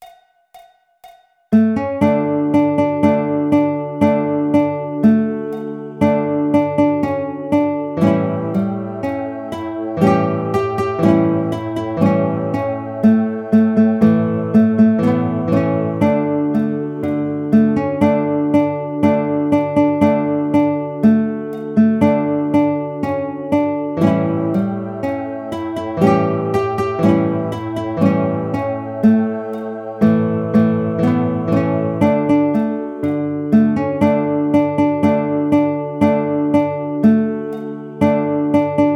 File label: Guitar